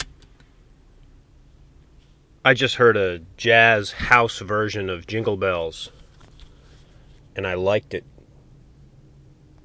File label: jazz house